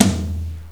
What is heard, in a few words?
r tom